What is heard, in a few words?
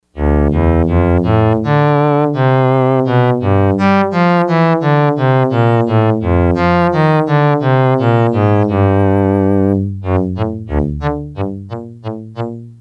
Box Cello, Yamaha DX100; Factory Patches